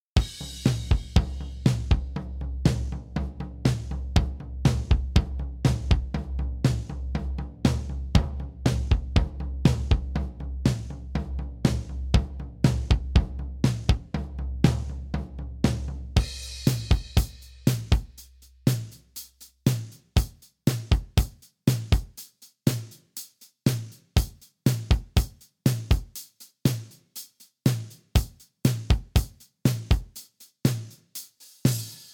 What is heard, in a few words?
ac rock